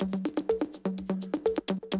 Classic DrumLoops, 125

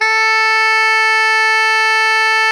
SOPRANO A 3, Saxophones; Greytsounds; Philip Wolfe David Crozier's Saxophones

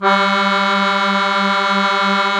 KEY, Accord Duo; Club-50 - Foundations Roland; Accordians

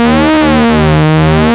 SYNC SWEEP D#0, ARP Synths, Analog Odyssey; E-MU Formula 4000 Series Vol. 3; ARP Synths II

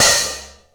Vintage Half-Open Hi-Hat; KJ Sawka; Drum n Bass & Beyond; Drum Tool Box

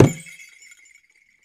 IYA ENU, World Percussion

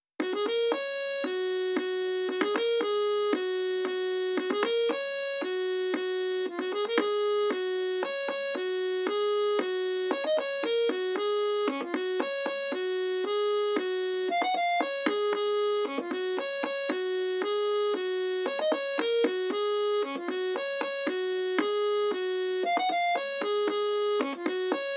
harmo chord

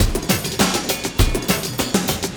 LOOP, Drum & Bass, 100 BPM

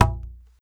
WD, DRM HITS; Spectrasonics; Liquid Grooves; WAVDRM HITS1

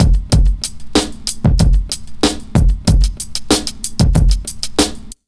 BEAT R, Pure Electro; USB Soundscan vol.03; AKAI

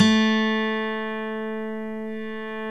6 STRING GTR; OMI - Universe of Sounds

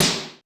Mega Drums, Korg 01W

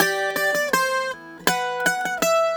Celtic Flavours; USB Soundscan vol.42; AKAI

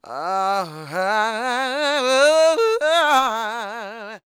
SING, Vocal Planet Blues & Soul; CW SOUL

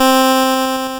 C4, LSDJ Notes; LIMAT LSDJ kit

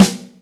Big Wood, Yamaha DTXpress Acoustic Snare

